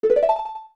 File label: Pause Menu Select; Make a selection on the pause menu; OOT